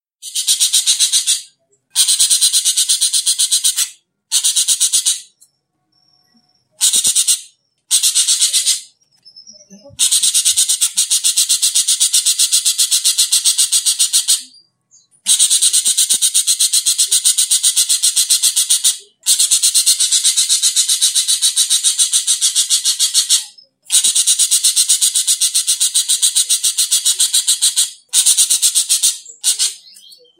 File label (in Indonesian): Burung Ekek Keling